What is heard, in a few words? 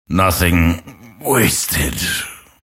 move pain; grimstroke